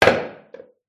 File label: pub wooden bar stool set down on tile floor; field reports